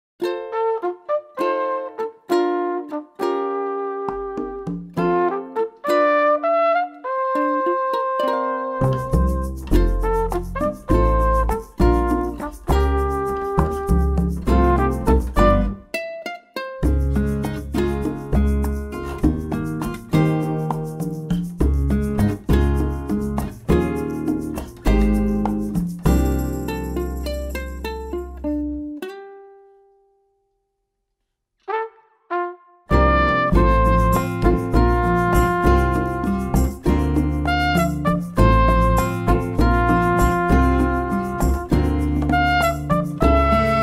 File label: music